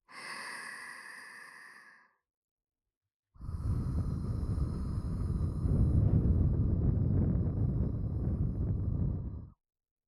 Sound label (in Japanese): 【左】耳ふー; 耳かき; (元右)